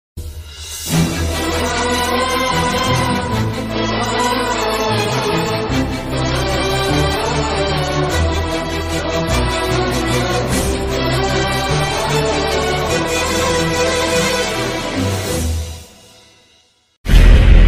emotional love bgm ringtone telugu movie bgm ringtone; pournami movie